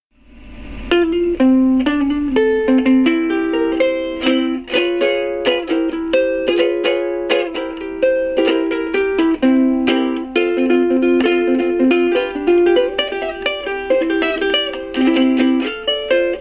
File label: honolulu stomp ukulele; trad